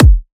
Riddim Kick

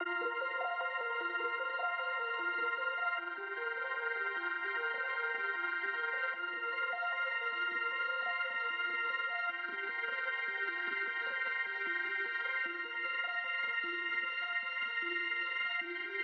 152 BPM E Min Arp; Trap; Melody Loops; Loop Stems; Cymatics; Nightmares Trap Melody Loops; Firing Squad

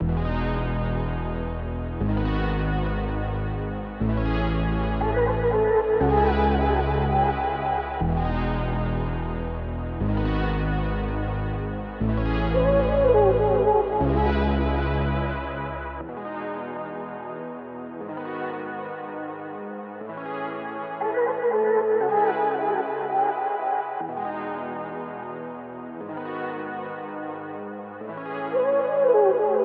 120 BPM C# Min, Melodies, RnB; Cymatics; GHOST (Beta Pack); Attachment

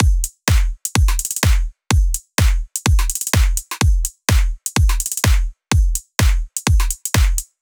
G House Full Drum Loop 126 BPM; Drums; Loops; Cymatics; EDM Starter Pack

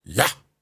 Grunts and Chants, Viking Vocal

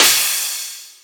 Crash, Dubstep Cymbals; One-Shots; Drums; Petrichor; Ghosthack; Ghosthack - AC2024 - Day 13 - Dubstep Sounds 2025

